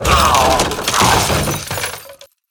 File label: Toothy Chest Death